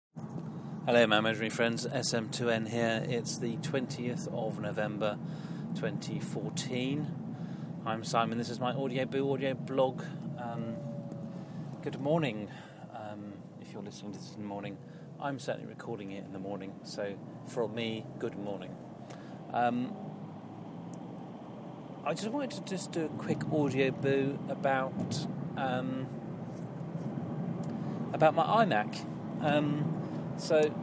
imac 24 inch early 2008 exhibits grinding noise again